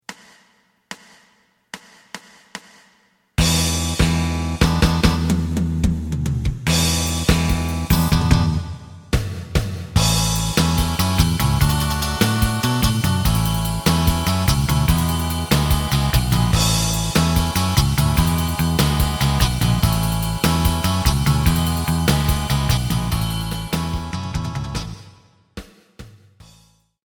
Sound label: lead